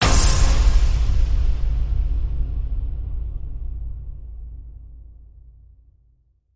Hit Big Firing Point

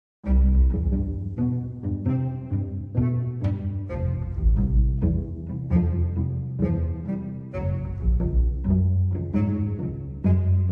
Chumps Promenade, No Drums

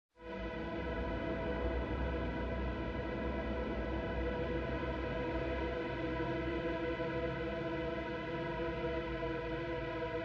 No Lead Chords

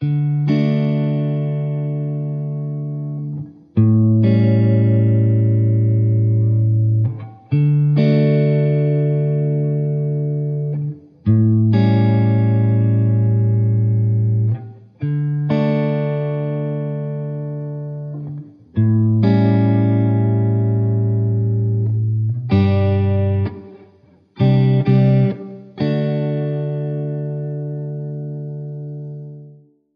Gentle Along The Way, No Drums

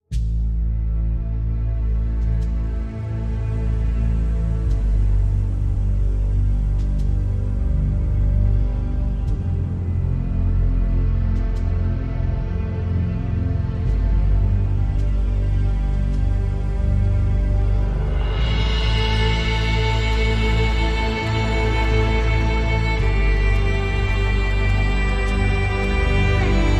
No Guitars

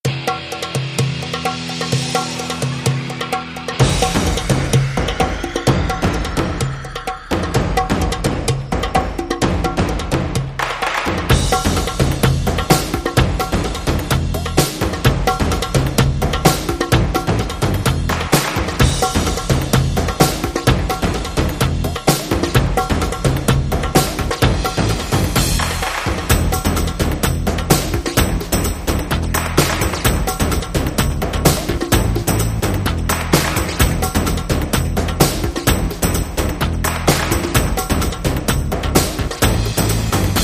Eastern Rhapsody Rhythm Mix

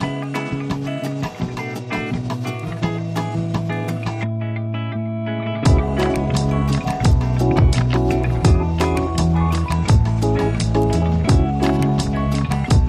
Barrio Groove

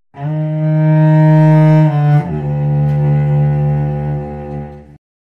Cello Whale Song

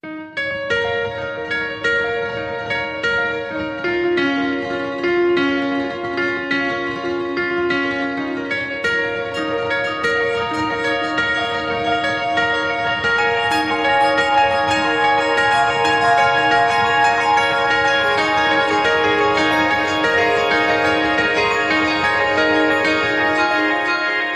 Bonus Beats

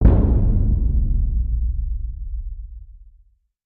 Hit Lo Taiko Boom

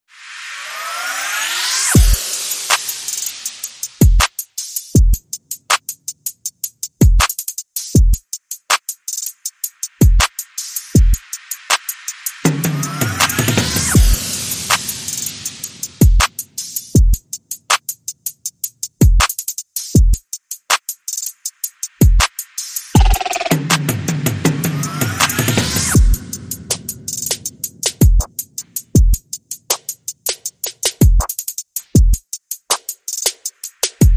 Perc and FX Only

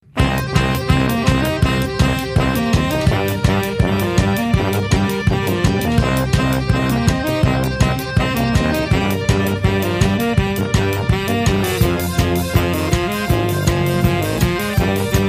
Acoustic Techno

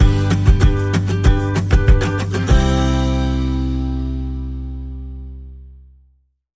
Bumper

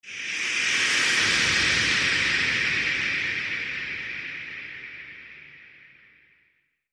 Transition Shhh Swell

